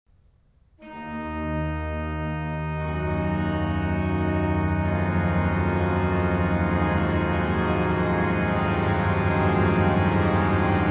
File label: Schnittke Organ